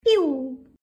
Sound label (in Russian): piu